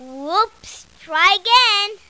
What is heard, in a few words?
bummer